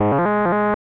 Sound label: Classic Item Fanfare; Pitfall The Mayan Adventure; SNES